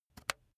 razor attachment retract soft

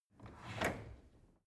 drawer cutlery open draw short

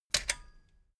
metal button stereo click disable heavy

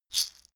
shaker wood hit single soft

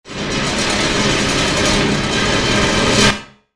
rollercoster

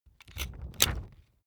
puncher operate heavy

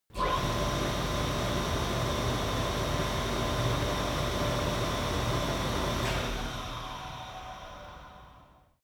flue gas installation start running stop

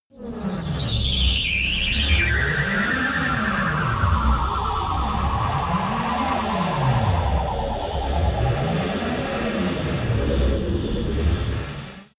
alien machine room

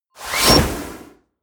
swoosh

